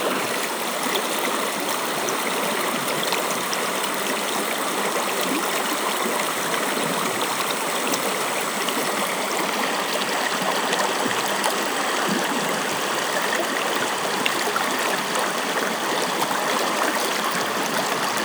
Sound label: Rainforest stream